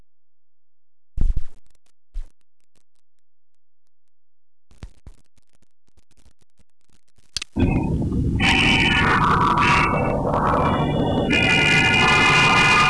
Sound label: hair